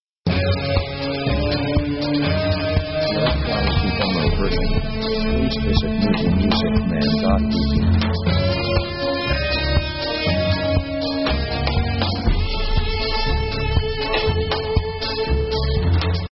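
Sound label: orchestra quirky comedy 120bpm; funny themes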